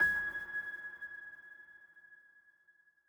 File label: piano note; gamerip; Beacon Pines